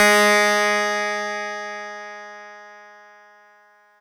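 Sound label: Fuzz Clav DX100 G#0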